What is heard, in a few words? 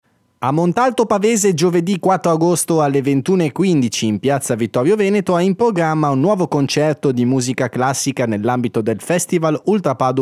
harmonic